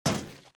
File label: grate step; Original TK Sounds